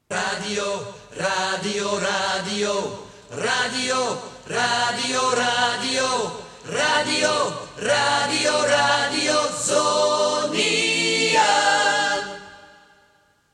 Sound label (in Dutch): Vingerknip a capella; jingles radio zoniën 1982-83